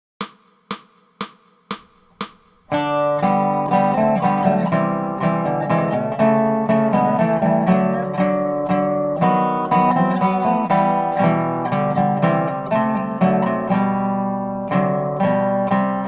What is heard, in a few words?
Dobro; We Wish You a Merry Christmas